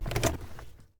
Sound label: washing machine laundry takeout